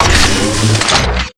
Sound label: grenade reload